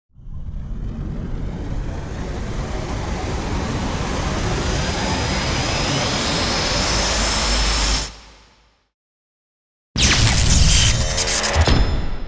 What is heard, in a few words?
base Game Sound; alchemist Stone